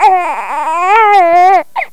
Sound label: ken cry